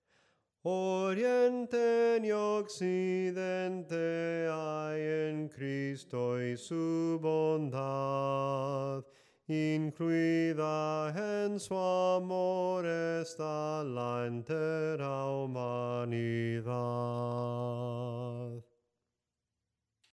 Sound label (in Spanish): SOPRANO CANTADO, himnos en melodia soprano